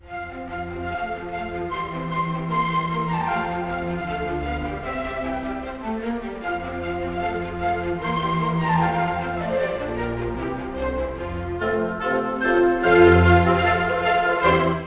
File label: mozart piano